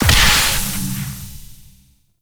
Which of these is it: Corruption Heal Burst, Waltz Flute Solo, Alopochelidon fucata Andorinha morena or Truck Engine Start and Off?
Corruption Heal Burst